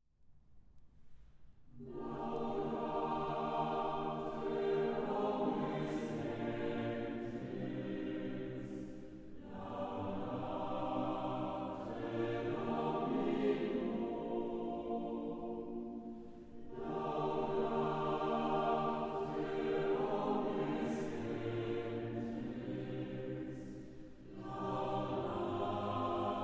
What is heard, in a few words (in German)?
Einzug des Chores, laudate omnes gentes; bach chor siege